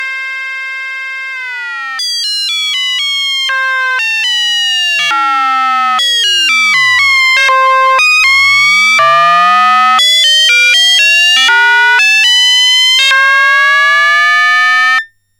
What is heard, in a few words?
logFM